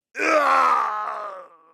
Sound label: impaled scream; mk9; sub-zero